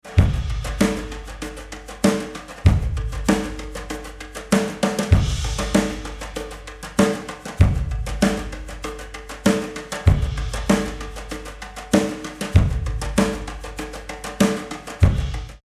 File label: drum recording, rock drummer, rock; schlagzuger; philipp kraus mixing producing